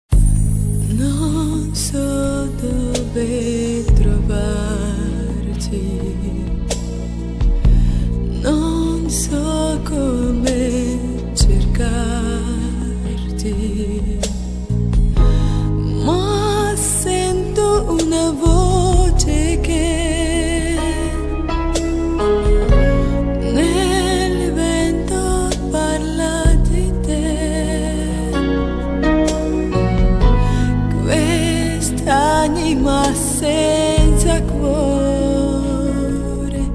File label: Adagio; Motorolla V3